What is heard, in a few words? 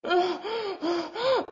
Pant